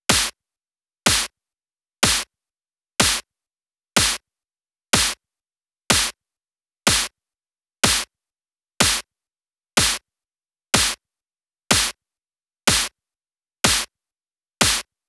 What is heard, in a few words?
Percussion Snare; drums pads